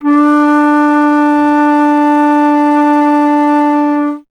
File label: Alto Flute